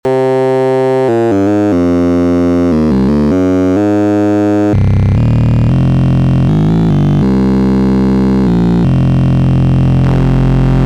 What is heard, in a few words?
wavetable radar Midi all all up